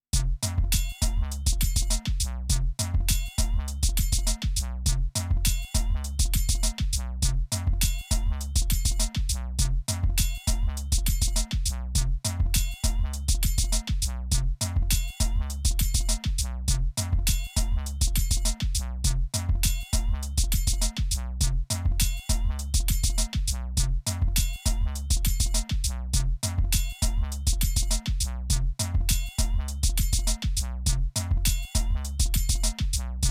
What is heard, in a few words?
Korg Volca Sample2